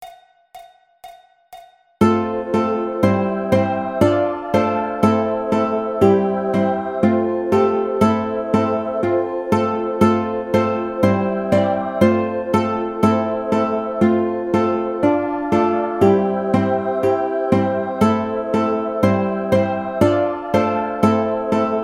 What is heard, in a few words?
Colorado Trail Ukulele